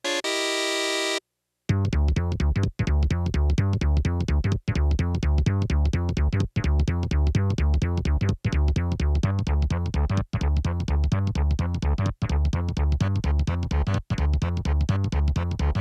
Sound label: BGM Bass Plus; Super Mario Maker